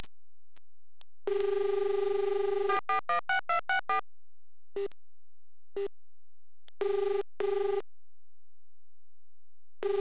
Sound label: ring external